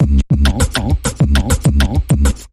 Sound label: Rhythmic Percussion Variation Short Version